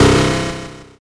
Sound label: zap